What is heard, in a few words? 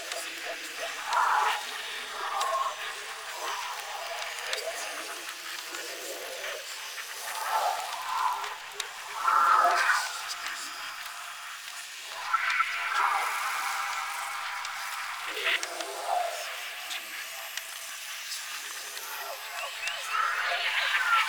idle loop; Dante Noctua; Warframe